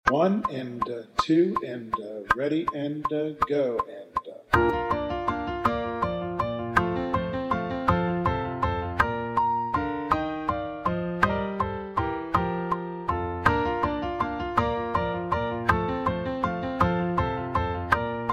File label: Arpeggios; Arpeggios 95; Clarinet; Stamitz 3